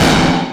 Dirth Attack Snare & long Rev; percussion; SDs; SamplePool3

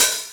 Mid open, HATs; percussion; SamplePool3

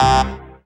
dance chord

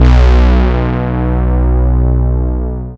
Analog Filter, baesse

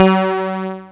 Bell Bassoon; instrumente

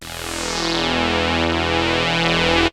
Saw swell; instrumente

